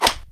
catch

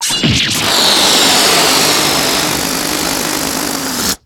air release

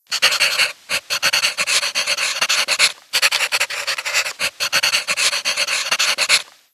writing

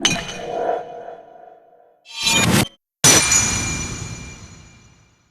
bottle drop; jezaille

